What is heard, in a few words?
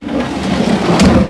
Wooden Desk Drawer Open; Max Payne